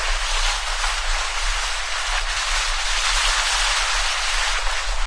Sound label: Vehicles Generic